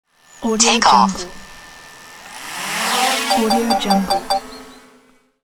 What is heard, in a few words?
drone take off with rc warning beeps; interface sounds